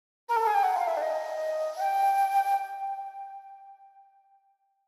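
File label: Flute Short Light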